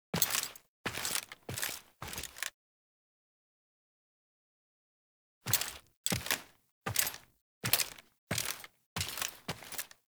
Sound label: Footsteps Foley; Arthur Interrupts Cyte; Nineteen Ninety Nine